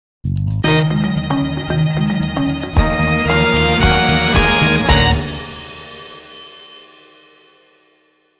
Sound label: Performance Tracks